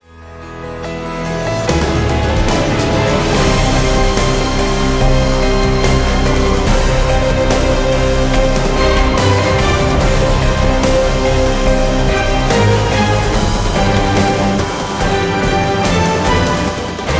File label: Epic Battle Climax; Epic/Hybrid; Scoring Reel